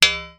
impact metal strip large ground; zapsplat

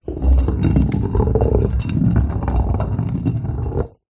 large empty clay demijohn roll on wooden floor

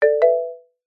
multimedia game tone marimba event action

